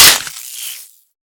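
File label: computer monitor; Call of Duty Modern Warfare; physics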